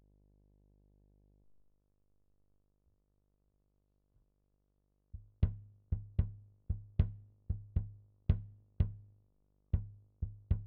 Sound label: Kick